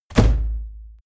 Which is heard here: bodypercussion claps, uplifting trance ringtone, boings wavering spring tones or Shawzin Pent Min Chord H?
bodypercussion claps